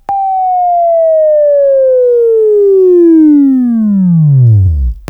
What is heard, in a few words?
Buzz, Right; Labview-Program; Sweeping Training program